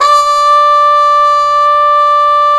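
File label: brass cup mute trumpet, dry; Roland LCDP12 Solo Brass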